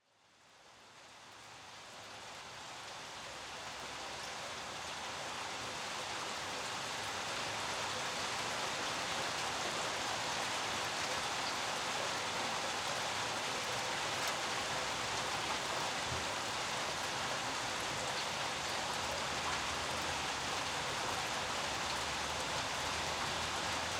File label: rain light on street